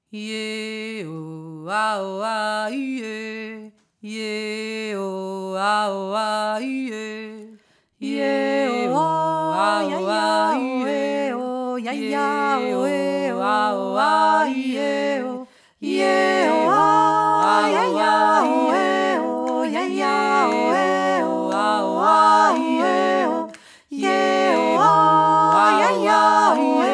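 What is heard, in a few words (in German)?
pygmäen song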